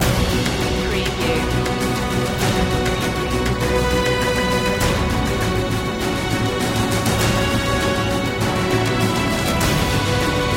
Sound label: no choir